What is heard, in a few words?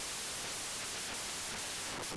noise; quantization